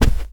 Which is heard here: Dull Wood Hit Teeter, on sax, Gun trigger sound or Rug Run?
Rug Run